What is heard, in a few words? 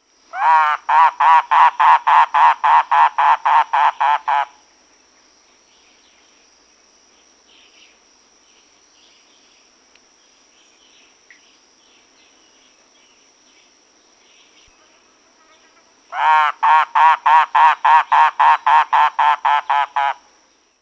mangrove cuckoo